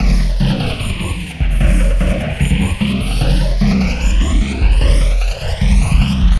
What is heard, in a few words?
Jungle Loop; Sonic Foundry (Sony Creative Software); Esoterik Beatz